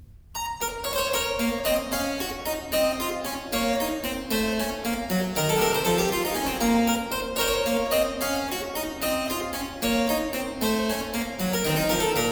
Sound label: Partita in C minor, Rondeaux, Keyboard Works; Partita No.2; BWV 826; Johann Sebastian Bach; Partitas